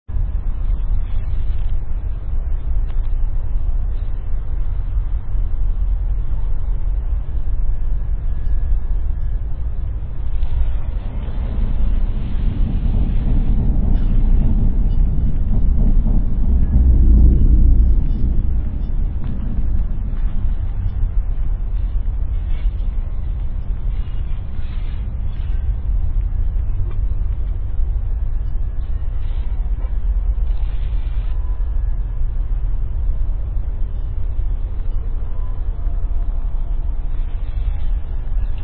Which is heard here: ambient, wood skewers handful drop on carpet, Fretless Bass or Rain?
ambient